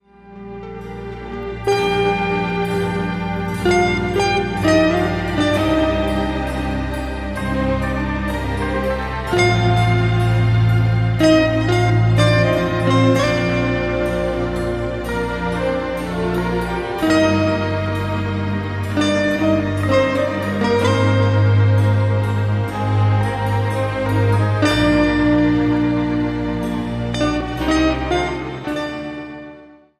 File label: Adagio In G Minor; Albinonis